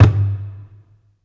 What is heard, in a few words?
drums perc hand; groover